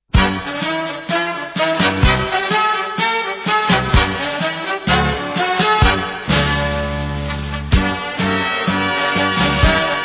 Tango, 32 bpm

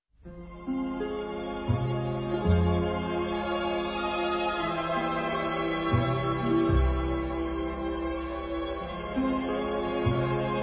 Waltz 29 bpm

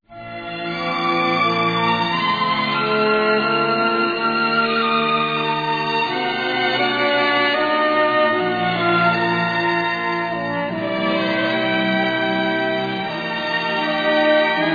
Waltz, 28 bpm

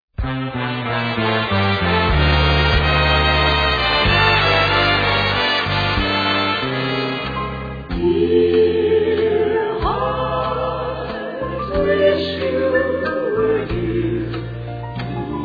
30 bpm

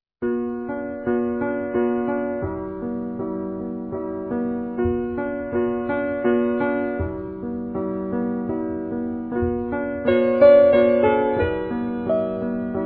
Waltz, 28 bpm

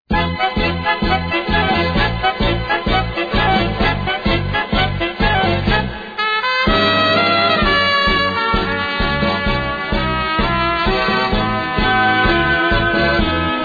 Tango, 32 bpm

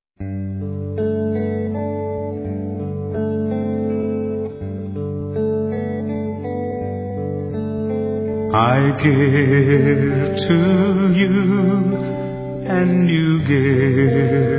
Waltz 29 bpm

